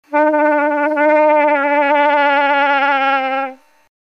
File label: Old Cow In Heat Long Call, Moose